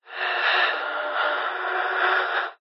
horror ghost evp eerie breathing fearful processed; zapsplat